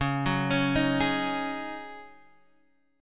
Db Suspended 2nd Suspended 4th, Guitar, Standard; x,4,4,6,4,4; arpeggiated

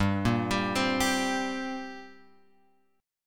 G Minor Double Flat 5th, G; Gmbb5 Chord {3 1 X 0 1 3} arpeggiated

